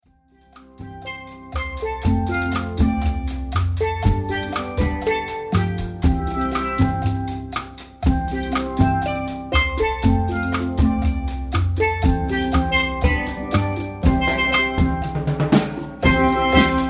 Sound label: Steel Band